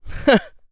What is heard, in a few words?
laugh; Eternal Return; ENG